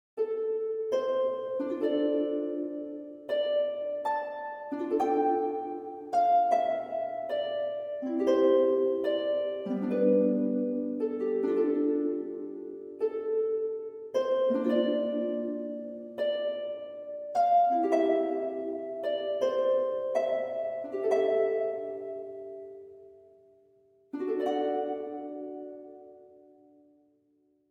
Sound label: Solo Harp Alone; Mx Cue Birth Solo Harp Alone